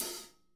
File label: hats, Drum Kits; Sonic Reality